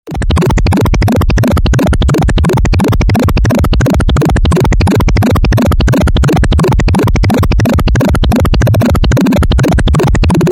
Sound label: wild fart